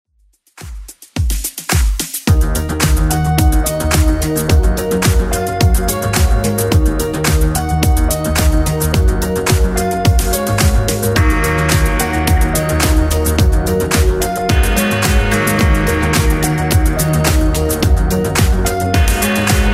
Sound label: 80s Club Edit 108 bpm